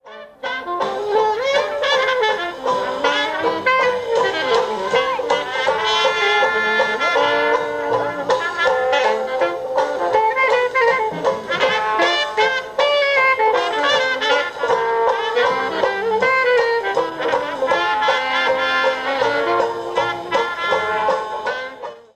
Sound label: acoustic quintet banjo bass clarinet trumpet drums